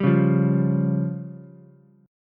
C sharp Major Flat 5th, Ukulele, C#Mb5 chord; C#Mb5 strummed; JGuitar

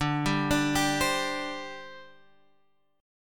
D 7th Suspended 4th D Guitar Standard; arpeggiated; JGuitar

